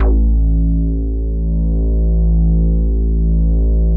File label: MEMORYMOOG